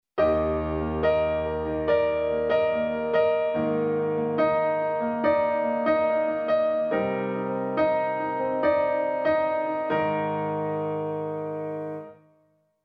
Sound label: Addictive Keys Studio Grand; XLN